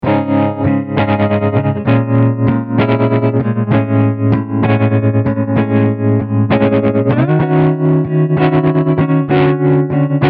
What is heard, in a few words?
pattern trem; mobius